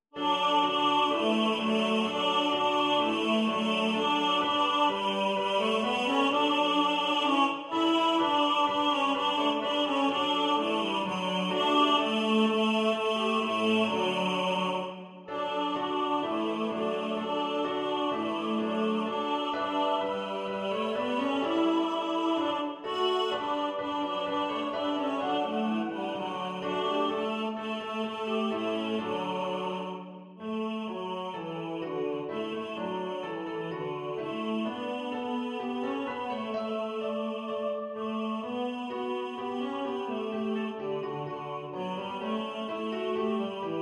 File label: May Day Carol TENOR